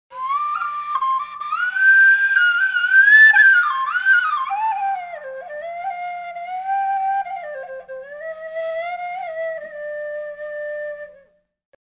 suling

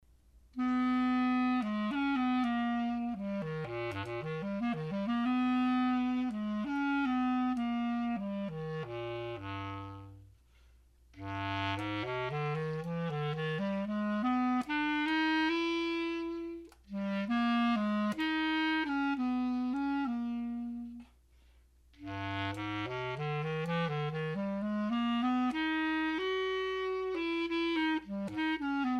alto clarinet

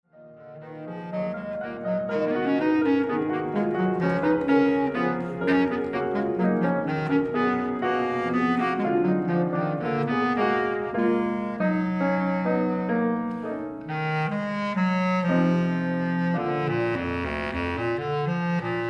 Bass Clar Gypsy Song

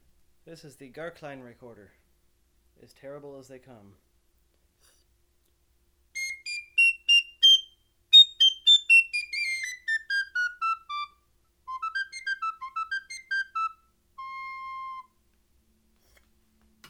recorders

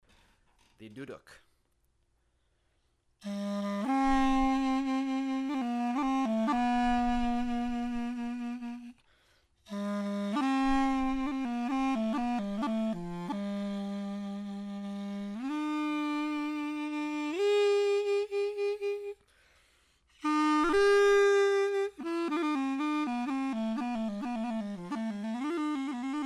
duduk

